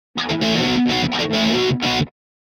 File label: Guitar Rock Finale Rhythm; Hollywood Edge; sound designer's toolkit v2